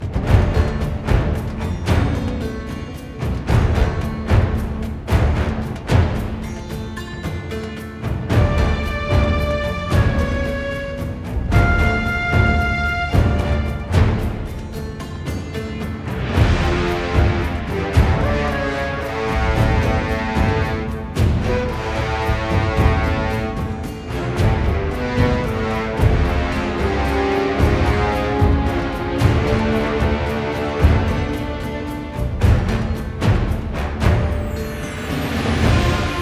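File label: background music battle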